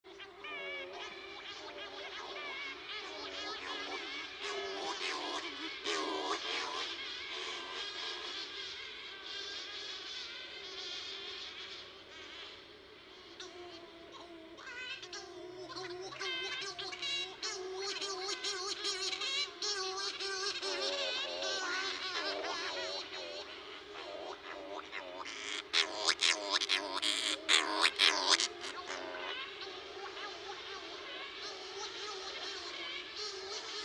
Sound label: corys shearwater